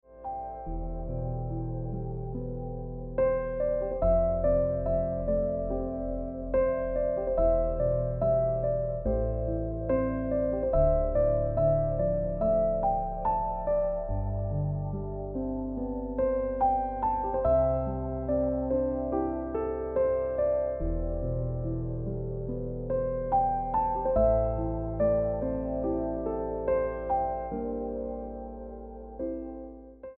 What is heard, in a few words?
Ringtone